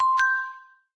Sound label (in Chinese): 钻石; Scratch; 黄金矿工加强版